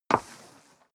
step leather shoes wood parquet slide long; schritte

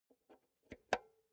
insert xlr multi socket slow, elektronik; objekte

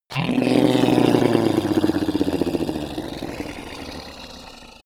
reptile choke angry; kreaturen

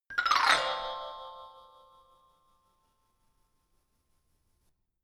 notification glockenspiel low slide down hard; instrumente

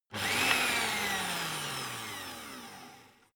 electric whisk rev high rear; haus-garten; kueche

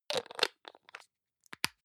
hygiene small screw cup close medium, badezimmer

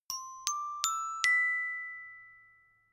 notification glockenspiel dry sequence ascend

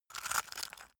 stone scrape short hard; natur-tiere; steine

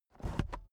car headrest full adjustment fast soft

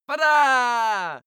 male attack, schreie; menschen

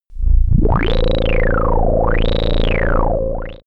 synthetic snarling; pulsieren

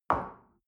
shell place on table hard; berufe; gastronomie; soundpool

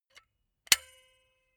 silicone gun bullet lock mechanism heavy; berufe; handwerker; soundpool

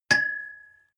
metal impact pole high medium; hits explosionen; metall

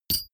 bullet drop metal

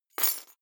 key ring large drop parquet soft; objekte; schluessel

